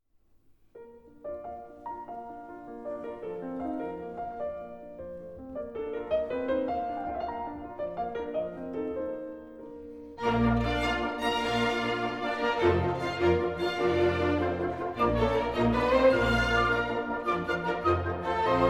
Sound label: Piano Concerto No 27 Allegro; Mozart